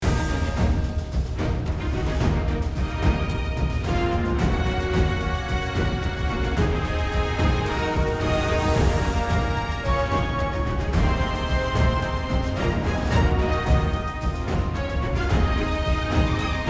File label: battle outdoor